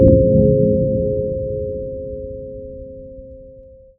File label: bong